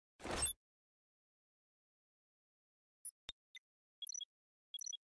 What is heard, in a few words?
planting c4